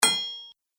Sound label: Metal Blacksmith